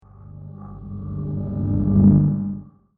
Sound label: Low Pressure Laser Force, laser sound